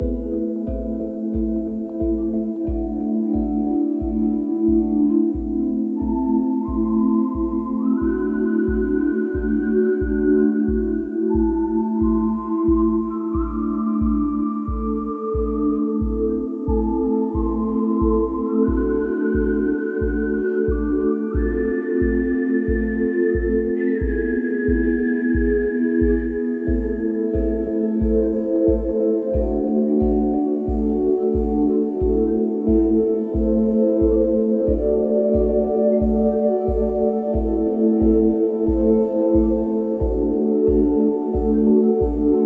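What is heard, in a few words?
Western Night Time Ambient Loop; Western NightTimey Amb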